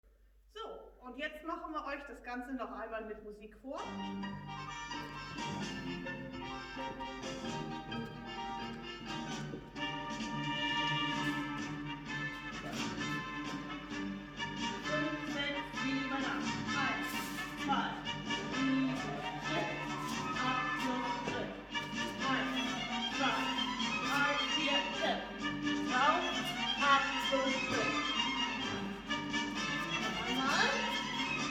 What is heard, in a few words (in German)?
Tango mit Musik